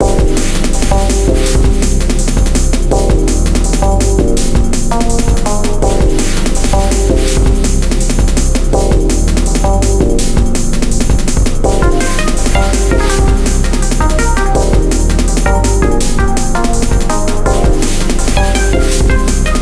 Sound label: Morning Rush, ringtones; KDE; Plasma-Mobile-0.1